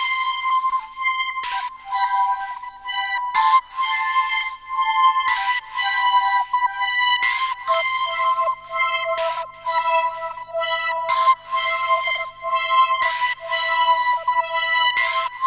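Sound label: SYNTH, TIMBA, Hip-Hop & R&B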